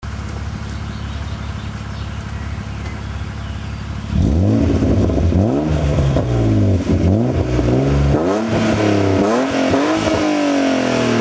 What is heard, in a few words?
bastuck 323ti compact e36